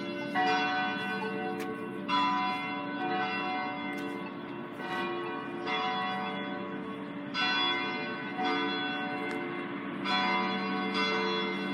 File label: church bells